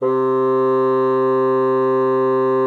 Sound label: bassoon, C3; Xsample VOL-6